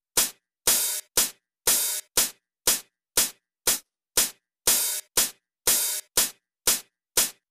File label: Hihat With Sample